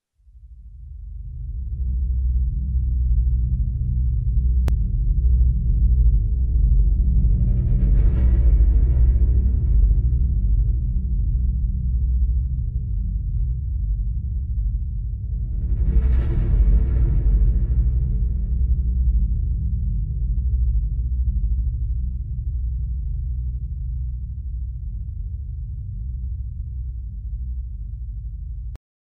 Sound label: horror bass ambiance sound effect; sfx bgm for videos films